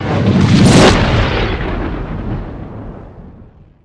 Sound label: Gate Rip Open, spaceship fx